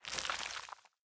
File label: arm; Smite; Cabrakan